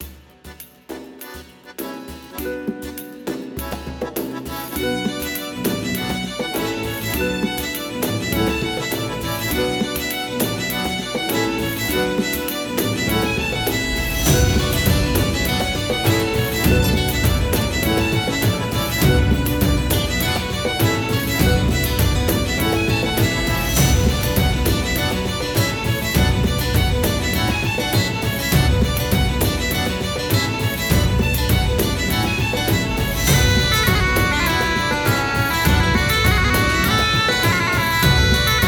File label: Irish Variation